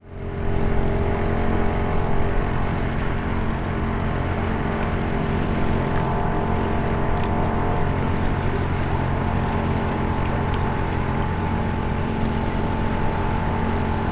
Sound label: ambience harbor entrance small motor boats pass children in bg small waves rocks; zapsplat